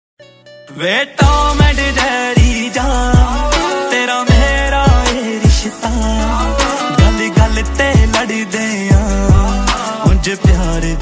punjabi ringtone punjabi song ringtone music ringtone